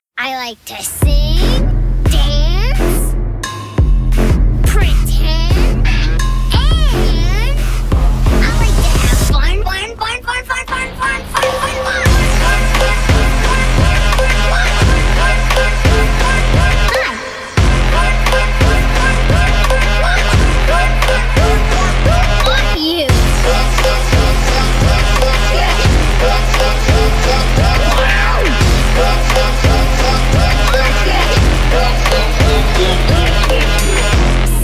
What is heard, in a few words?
kazoo